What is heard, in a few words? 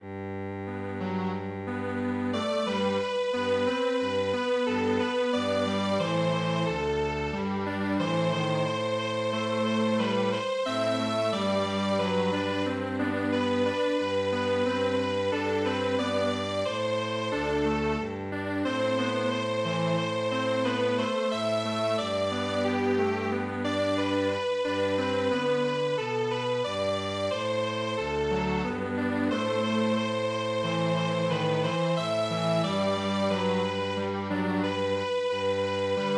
Strings Quartet; goose march